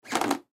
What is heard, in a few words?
Floor Squeak, Floor Squeak Creak Crack; Household; Squeaks; Objects; Blastwave